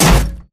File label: bullet heavyballistic titan; Titanfall 2